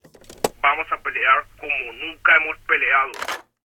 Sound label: answer Phone; narcos